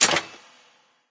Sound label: click; smite; chronos